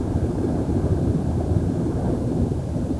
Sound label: player swim